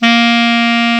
Klarinett; Alpine Volksmusik

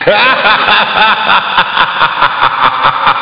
LACHEN; ST-XX Amiga Soundtracker Sample Packs

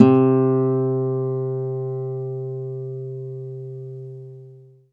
KONZERTGIT, Alpine Volksmusik

